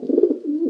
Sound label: pigeon idle, creatures; Counter Strike Model Pack; CS:GO